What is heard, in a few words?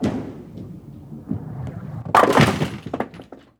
Bowling